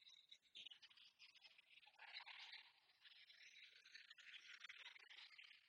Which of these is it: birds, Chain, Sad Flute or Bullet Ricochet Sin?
birds